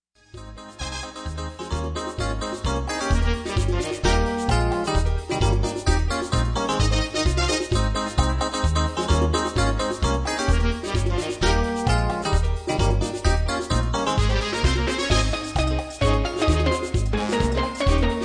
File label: Merengue Medley